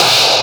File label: FUZZ CLAPZ; Spectrasonic Distorted Reality 1; PHUZZ DRUMS